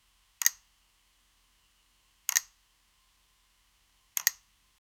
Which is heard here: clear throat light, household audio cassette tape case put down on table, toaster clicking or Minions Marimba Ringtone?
toaster clicking